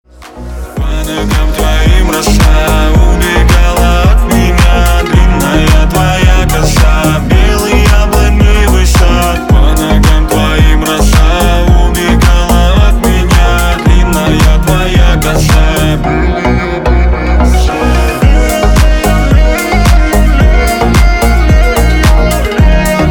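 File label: Russian music for phone ringtone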